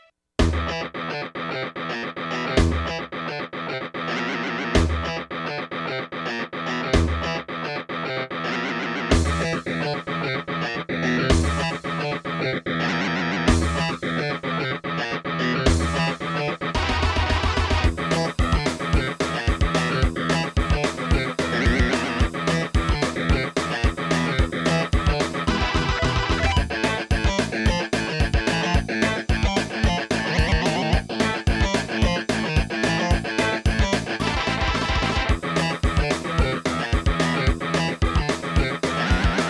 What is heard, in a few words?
Soundscape; Soundscape Vivo90; Doom E1M1